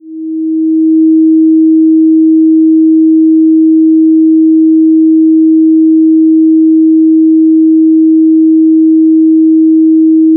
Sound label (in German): Planetenton; Harmonia